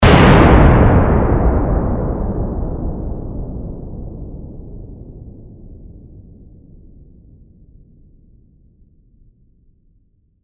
Explosion, Blast, Trail, Off; 5.1; Sound Effects:Science Fiction; BlastWave

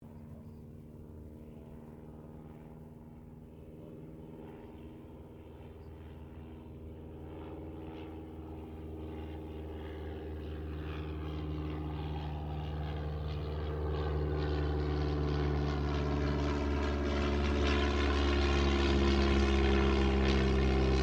AIRCRAFT DH82A DEHAVILAND TIGERMOTH PASS

